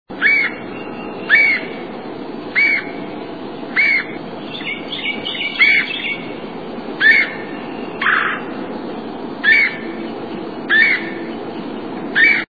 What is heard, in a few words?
Mississippi Kite